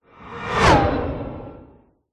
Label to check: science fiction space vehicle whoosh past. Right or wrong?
Right